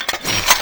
basic attack draw; LoL; varus skin03

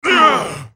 dying; Brand

